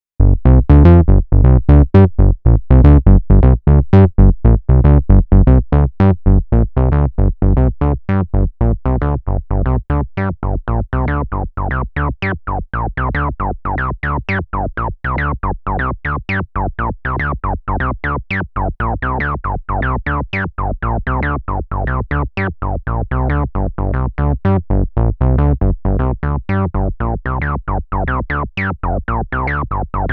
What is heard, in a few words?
Resobass; Model15